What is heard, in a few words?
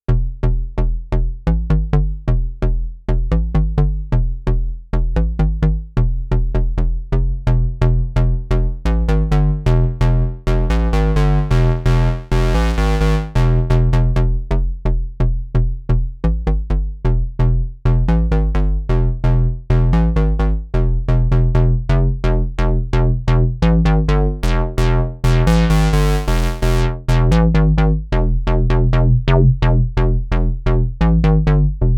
Square Bass